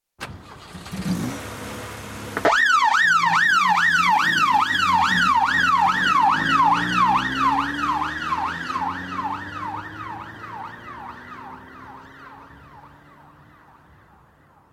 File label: Police car start and depart with yelp siren; Emergency Vehicle; BBC